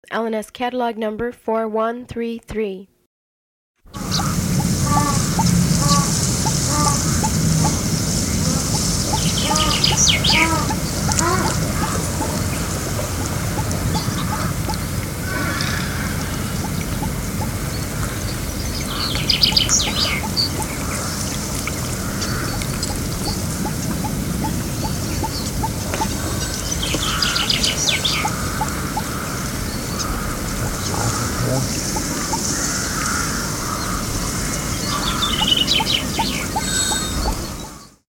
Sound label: white-backed duck, bird sounds calls